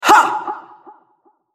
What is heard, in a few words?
effort; RumbleSkin03